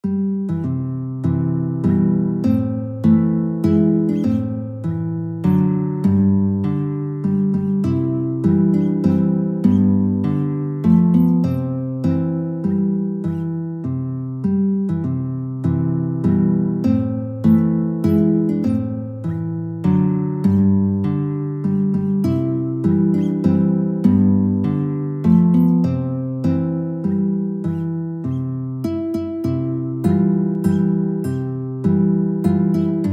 star spangled, guitar

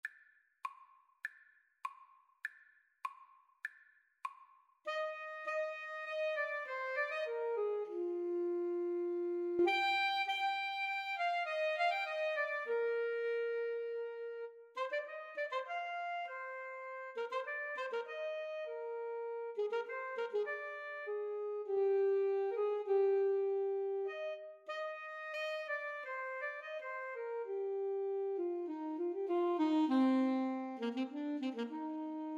Alto Saxophone Duet

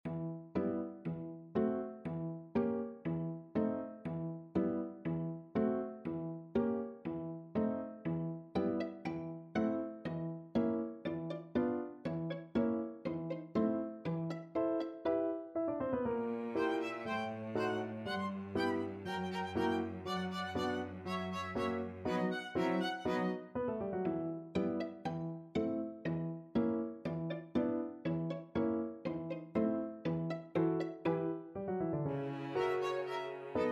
sugar plum, piano quartet; tchaikovksy

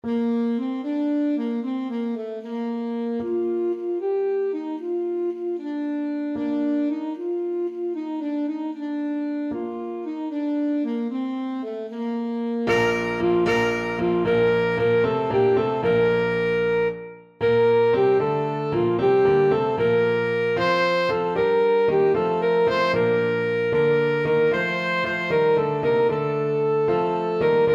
orientis, saxophone

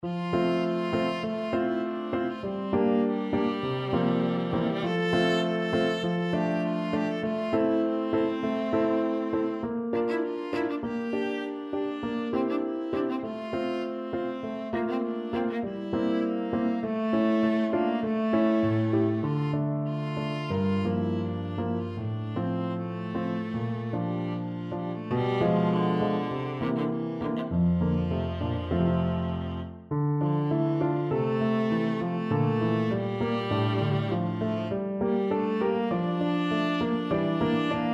viola

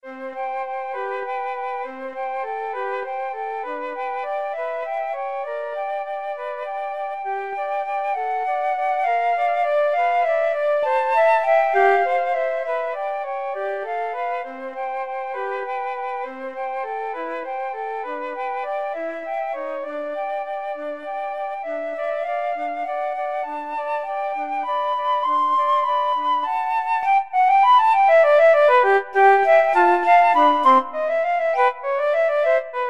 tchaikovsky waltz, flute duet

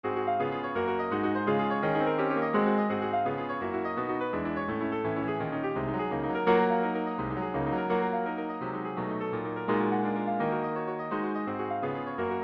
etude, piano

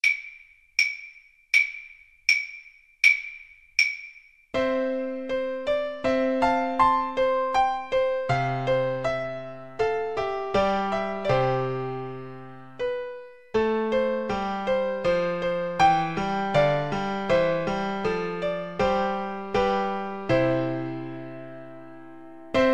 gluck dance kar, Flute

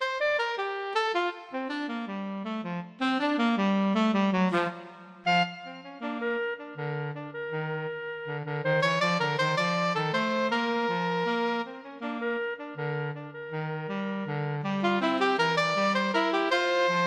alto saxophone duet

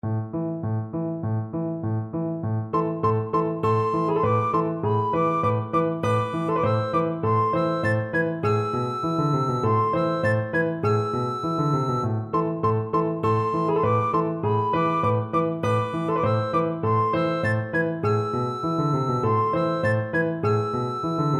recorder

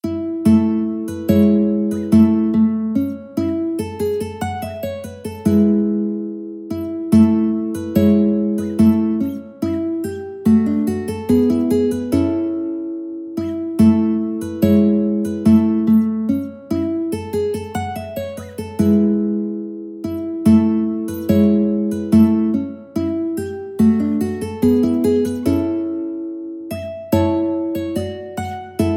mandolin

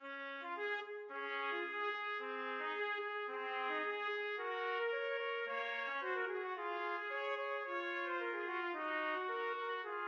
sym 5 trumpet duet

